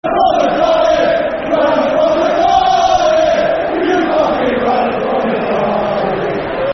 Ran From The Cardiff [Football Chant (Soccer Song)]; Swansea City Scfc